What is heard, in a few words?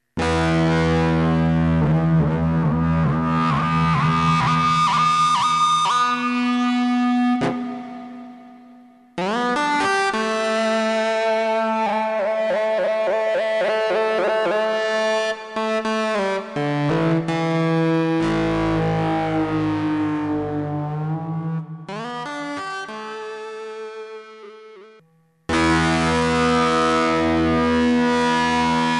Distortion Guitar